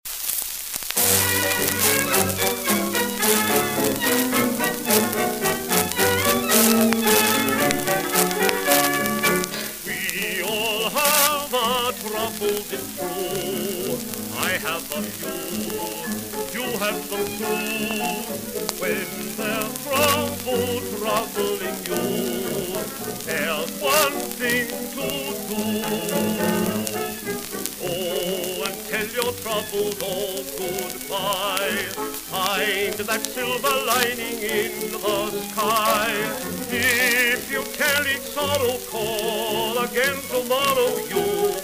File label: Mono